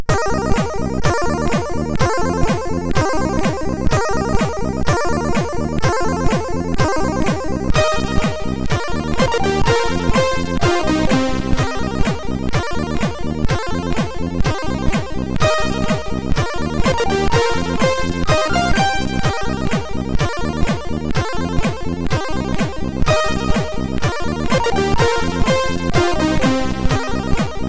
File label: sid MOS6581R4